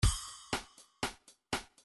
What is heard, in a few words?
reggae 1bar